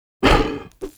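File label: war attack, Mob; splinter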